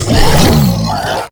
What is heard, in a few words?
Flying Mouth Attack Fire; Enemies; Warframe; ManInTheWall